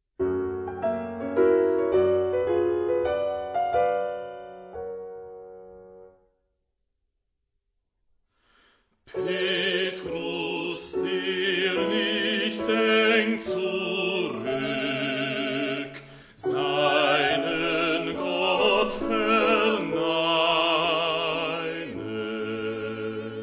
Petrus der nicht denkt zuruck bas zang

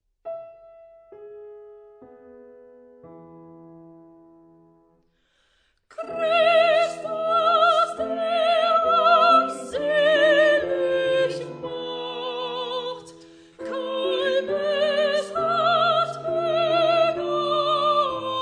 Christus der uns selig macht sopr zang

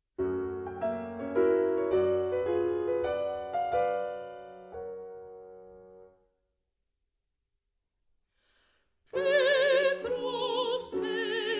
Petrus der nicht denkt zuruck sopr zang

